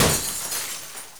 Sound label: GLASS BREAK